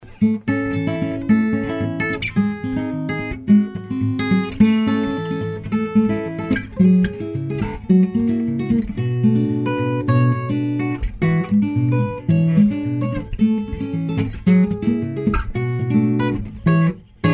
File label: Sample, guitars